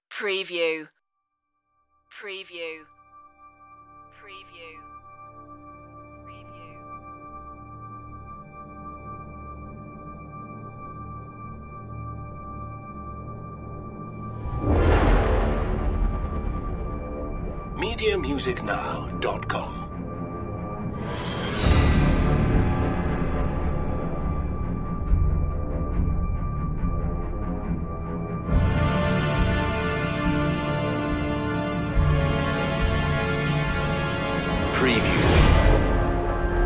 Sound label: hells chant no choir